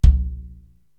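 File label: bass drum Jazz, Korg TR-Rack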